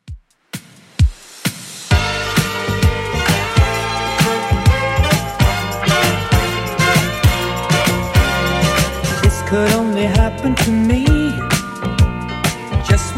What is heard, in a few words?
70s Redrum 131bpm